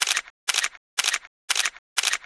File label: camera continuous; Oppo R821T